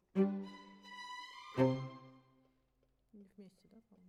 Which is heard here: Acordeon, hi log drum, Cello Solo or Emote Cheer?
Cello Solo